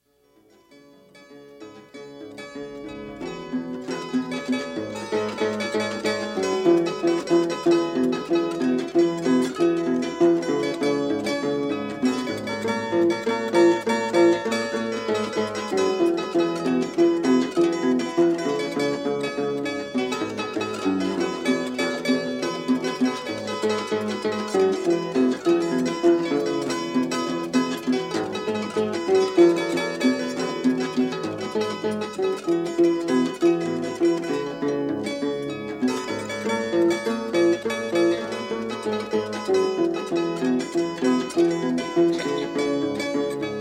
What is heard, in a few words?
harp excerpt